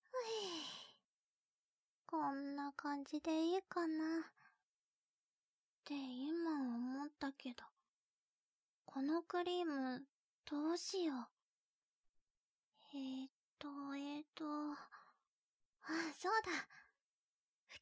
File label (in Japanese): 耳をタオルでごしごし